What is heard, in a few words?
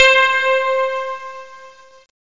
fm piano, keyboards; Zoom - Release 2; Active Software